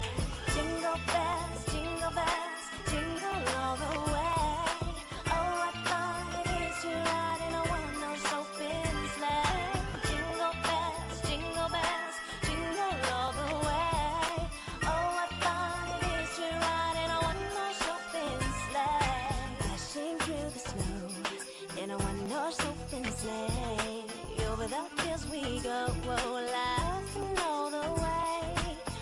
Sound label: jingle bells; home alone 4